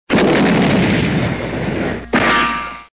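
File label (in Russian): пушечный выстрел и мультяшный звук рикошета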